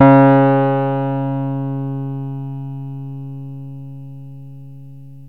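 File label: acoustic piano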